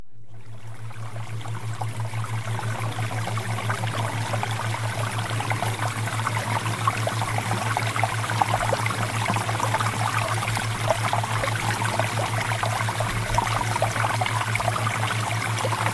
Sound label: Water Theta Waves